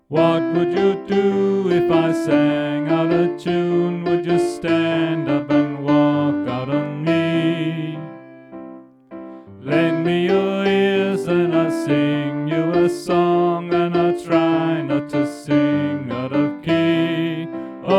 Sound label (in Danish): Sopran; oevefiler